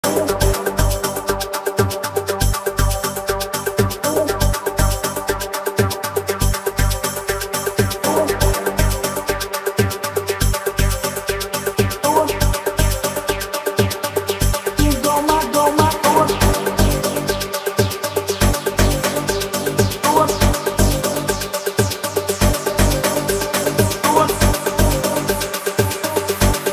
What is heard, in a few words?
afro house